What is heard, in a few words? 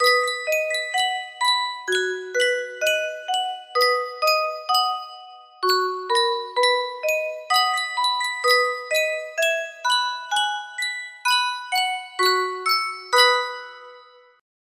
music box